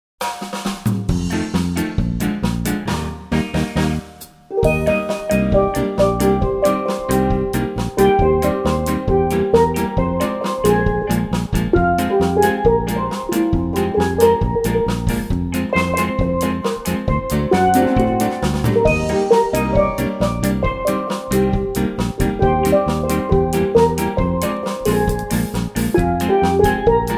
Jingle Bell Ska, Steel-Drum-Band

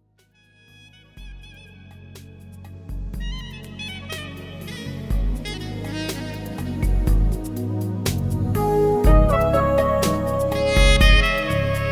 saxophone

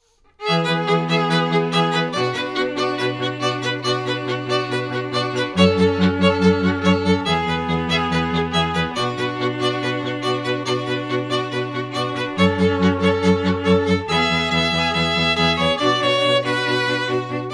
Classical; European Ensemble duo trio quartet

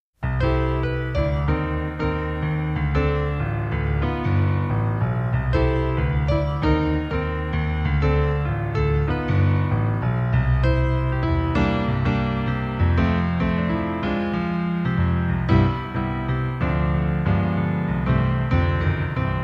Piano

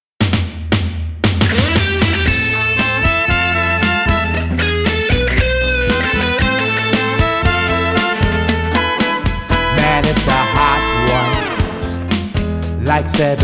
cover band

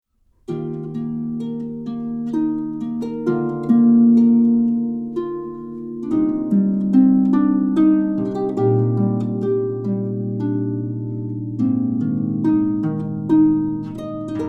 Wedding Song, Harp

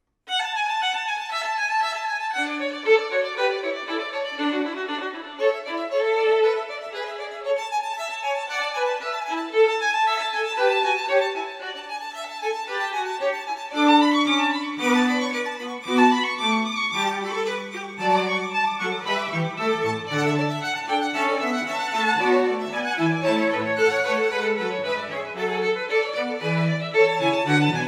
Corelli, string quartet; Eloquence String Quartet

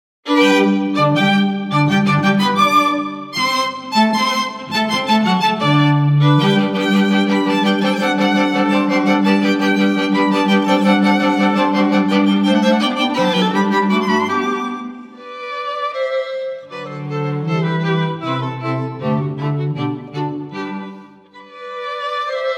String Quartet; Dolce Vita Strings; Eine Kliene Nachtmusic W.A.Mozart

